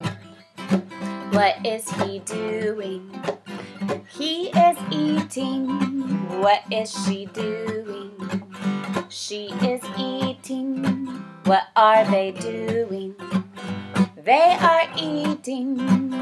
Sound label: Eating Song